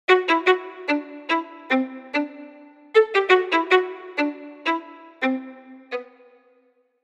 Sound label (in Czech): VNO SOLO stacato